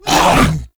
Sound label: Infested Shotgun Reload; Weapons